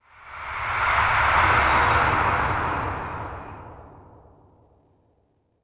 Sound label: park occasional